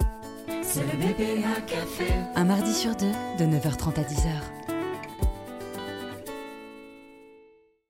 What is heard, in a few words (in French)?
jingle intro; Caisse d'epargne